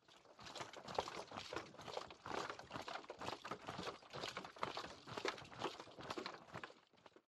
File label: Steps; Microsoft Studios; Age of Empires III - Complete Collection; cinematics